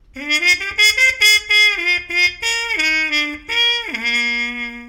Muted Trumpet

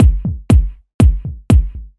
Minimal House Beat

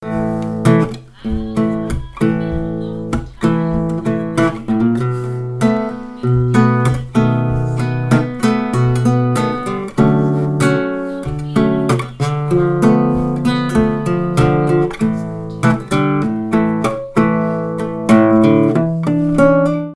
Guitar Rhythm Loop

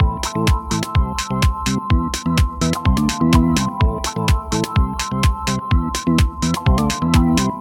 Old School House

